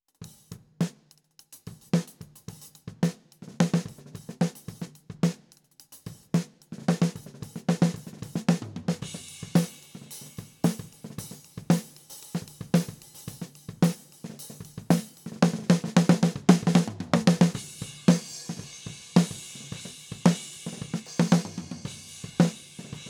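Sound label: kick head on far snare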